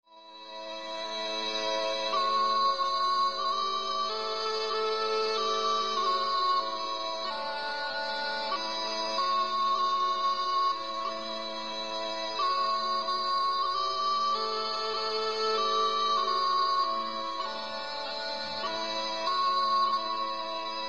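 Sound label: chamber music string quartet; Celtic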